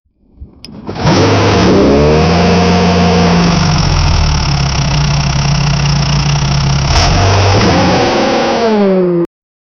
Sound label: generate a deep powerful, truck